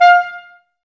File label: clarinets, Wood Winds